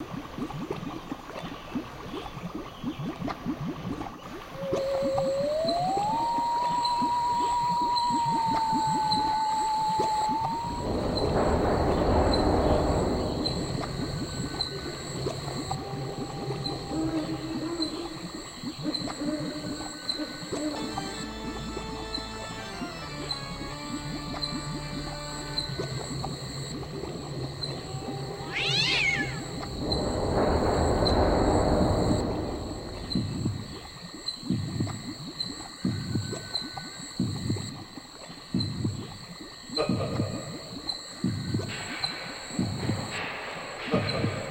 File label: Halloween Ambience; SpongeBob Moves In; android